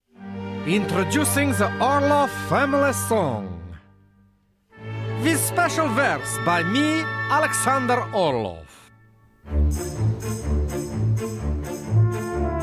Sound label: Song